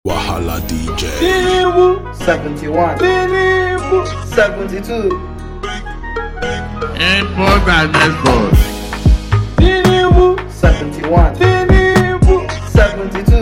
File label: Beat